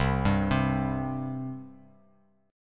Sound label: C 5th C down; C5 arpeggiated